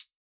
Hihat Closed Gm Hit Analog

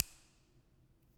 Finger Snap

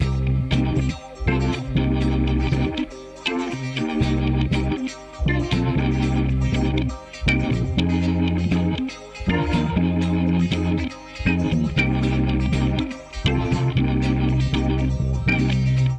120 Cm Combi Music

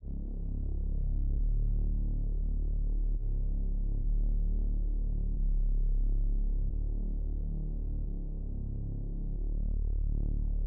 130 Bass D; Dead End; OSAKA NIGHTS

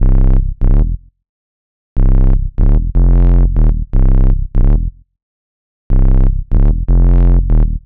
Cmin Freak Bass

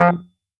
F Clav

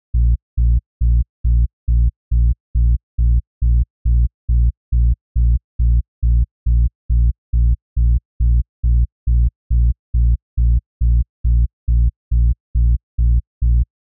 SUB BASS (NOTE A-)

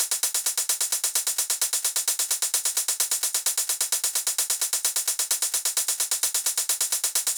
Drum Loop 130BPM

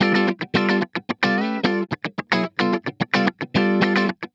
110 G 9th Chord Guitar

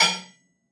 cinematic kit cowbell hard

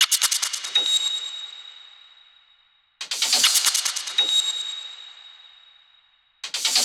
140 Fx Glass

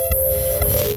abstract fx

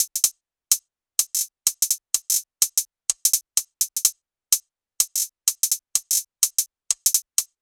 Drum Loop Top 126

